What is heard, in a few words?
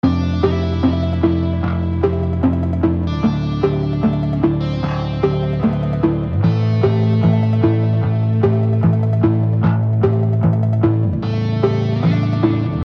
PHONKY